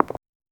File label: rapid hollow chattering, buckles